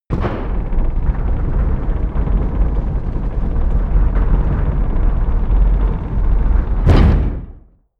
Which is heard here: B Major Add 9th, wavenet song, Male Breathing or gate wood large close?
gate wood large close